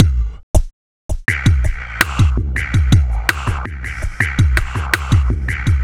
BASSOMATIC, LOOPS

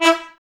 BRS, Woodwind Brass Ensemble; Miroslav Vitous

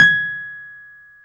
PNO Wurly G#7; Keyboards of The 60's and 70's

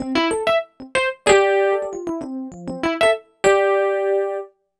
EP, Keyboards, Electric Pianos

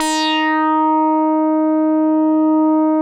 MINIMOOG; Big Fish Audio - First Call Vintage Keyboards

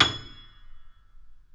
Mf G#6 off, Grand Piano off mic; AKAI S6000 CD-ROM - Volume 2

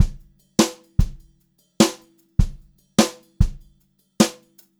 JZ VARI, Brushed Drum Loops, JAZZY; USB Soundscan vol.39; AKAI

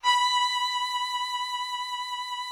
VIOLIN B4; VIOLIN+VIOLA; Greytsounds VOL-1

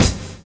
OPEN FIRE; Univers Sons - Kitch 70s

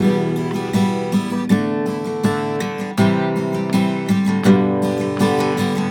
EMGTR; USB Soundscan vol.42; AKAI

